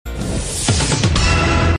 stinger

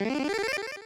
confirm; street fighter ii

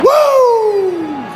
flair wooo

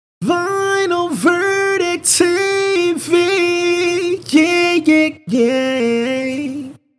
chant; vvtv

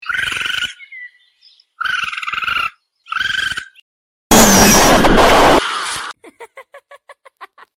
cute jumpscare; suzy

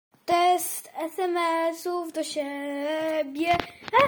test sms

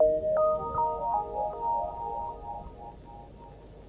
jingle

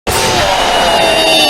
jumpscare; fnaf 4 plushtrap

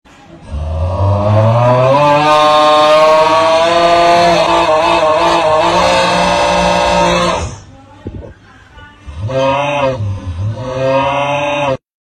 aughhhhh

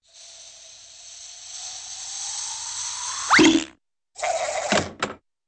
suction wheet plunk spin and rattle

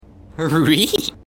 rrhrreeheeheeheehee

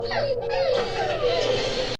whimper; chase rubble rocky and zuma

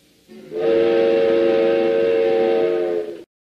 train whistle; disney

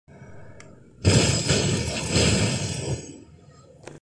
chains slash x3; fortnite; chains of hades

